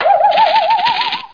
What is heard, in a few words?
fly try